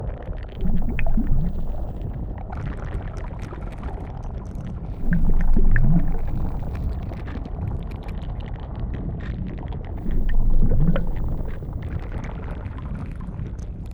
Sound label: Lava Deep